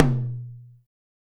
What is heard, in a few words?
Toms